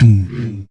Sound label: percussion, Severe Gate Kit; Dance Synths